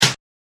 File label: snare